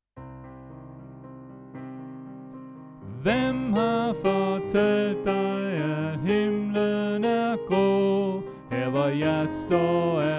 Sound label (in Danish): Sopran; oevefiler